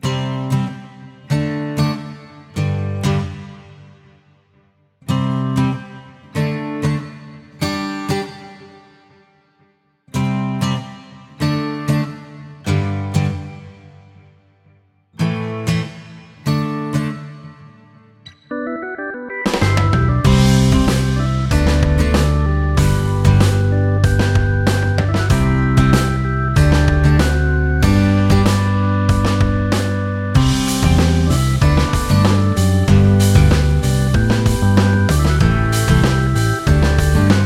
Band type heartwarming music

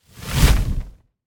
zuban fire

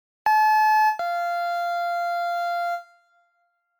Front door bell

